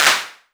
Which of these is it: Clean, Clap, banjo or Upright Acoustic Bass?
Clap